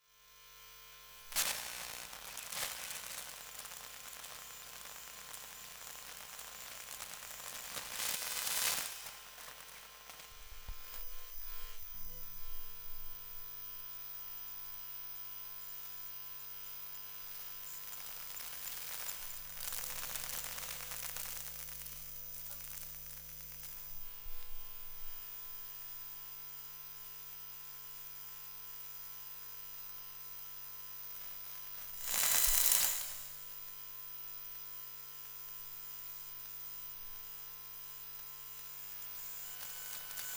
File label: Electromagnetic Fields, Buzzin; electric fields